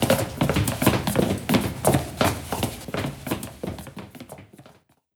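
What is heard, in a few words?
footsteps multi; ASHIOTO MULTI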